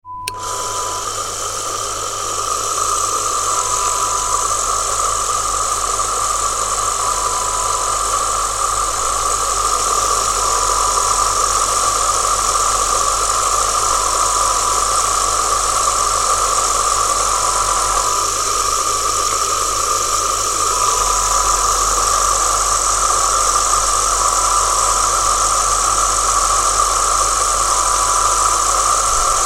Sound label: Vortexer sound